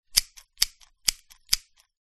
office scissors cut air x4

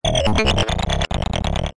science fiction robot glitch vocalised

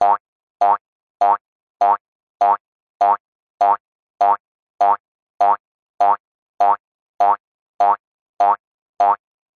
cartoon bounce jump hop several frog slow; zapsplat

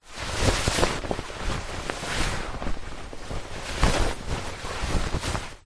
foley clothing suit trousers pants handling

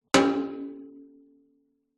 impacts metal drum thin light resonate

